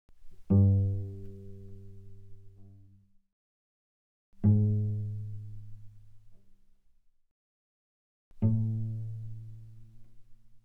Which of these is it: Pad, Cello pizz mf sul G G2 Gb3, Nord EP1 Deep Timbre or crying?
Cello pizz mf sul G G2 Gb3